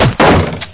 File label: Kick Door Down